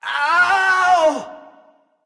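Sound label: non verbal ouch, voice; cernunnos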